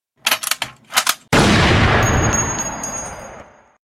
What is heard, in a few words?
reload and shot